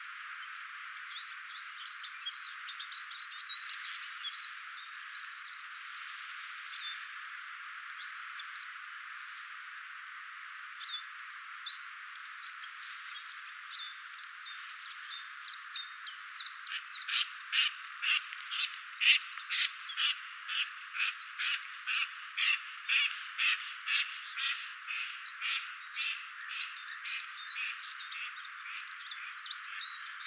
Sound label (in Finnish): tavinaaraan ääntelyä kun se nousee lentoon koiraansa kanssa